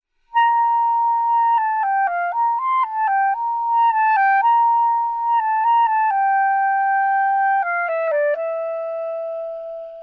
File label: Bolero Eb solo; Ravel Bolero